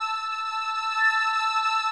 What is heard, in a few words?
house synth 125 A, keys